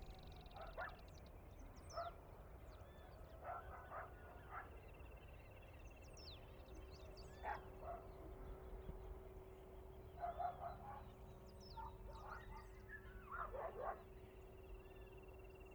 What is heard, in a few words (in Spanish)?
AMB EXT AMANECER CAMPO Trinidad UY Generico